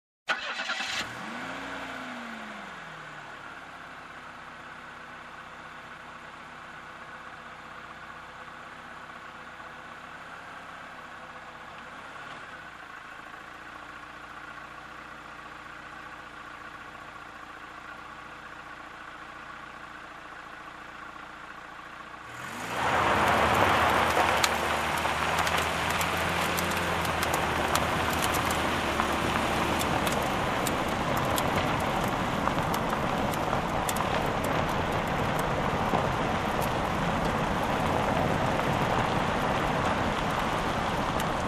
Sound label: Driving on dirt road with window open